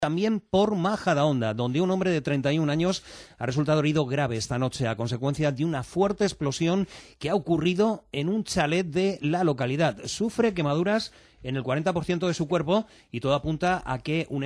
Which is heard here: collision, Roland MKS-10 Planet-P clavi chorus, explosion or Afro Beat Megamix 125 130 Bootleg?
explosion